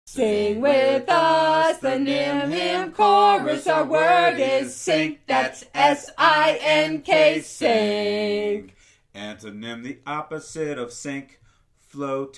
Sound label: Chorus Sink